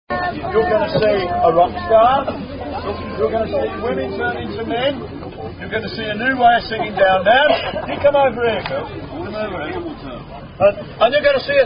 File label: Air Guitar Down-Downs